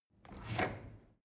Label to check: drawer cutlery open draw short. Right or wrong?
Right